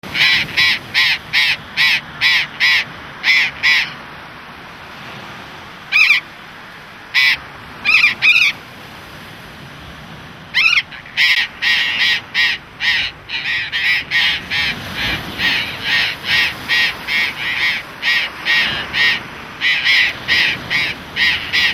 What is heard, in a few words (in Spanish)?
Loro Barranquero voz, aves